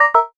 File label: bad move; Brawl Stars